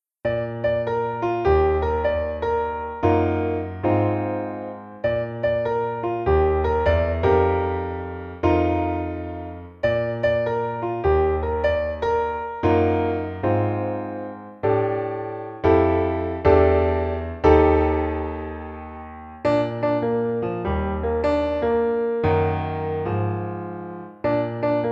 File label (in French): Piano seul